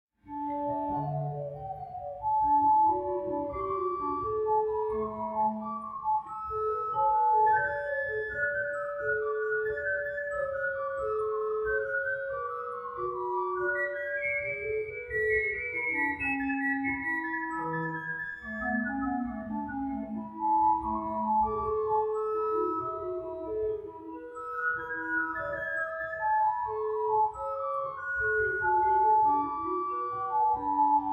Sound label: Two part invention in D Major